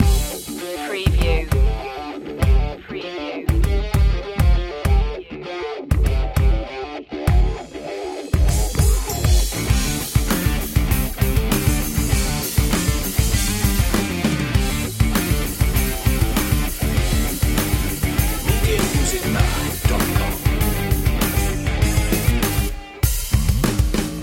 hard like a rock loop

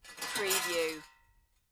Metal Rattle Grind Short

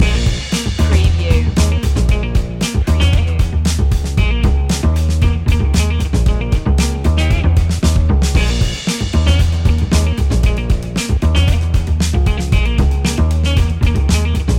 funky jazzy flow loop

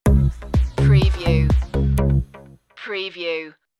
dancefloor stinger

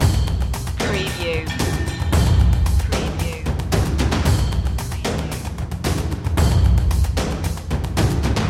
junkyard madness loop

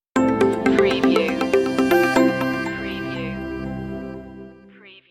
think new stinger

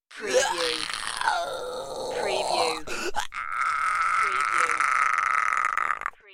Man In Pain

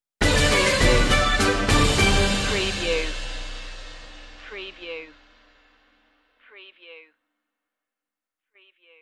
big top stinger

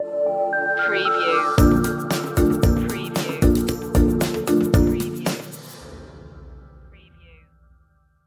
diminishing returns stinger

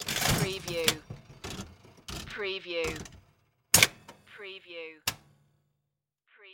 Slot Machine Insert Coin Handle Pull And Wheels

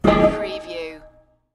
Keg Bang

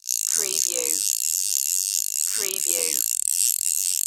Fly Fishing Reel Pull

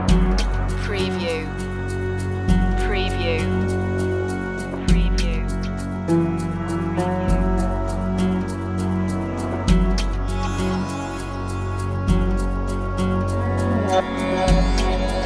indian morning loop, chill

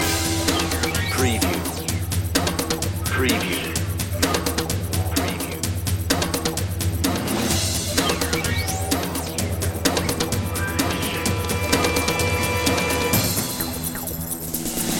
digital voodoo loop

